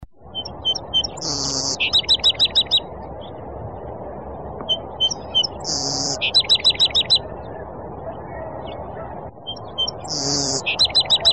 Song Sparrow

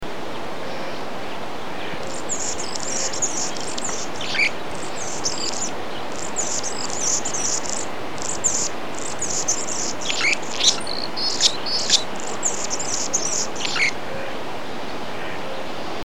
Annas Hummingbird

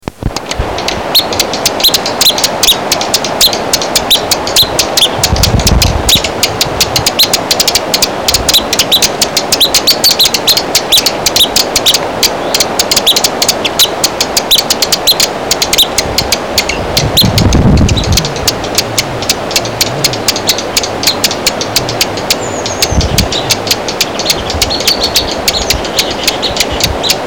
Dark Eyed Junco

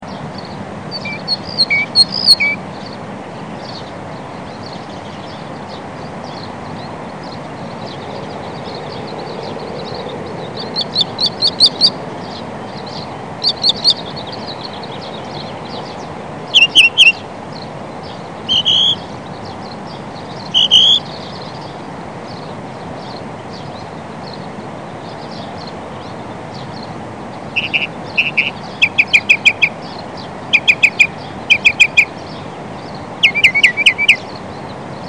Northern Mockingbird

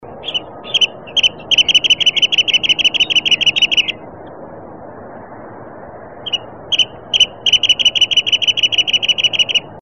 Wrentit

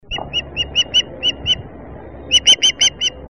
Osprey

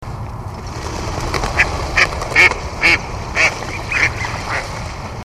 Mallard

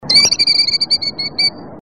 Killdeer

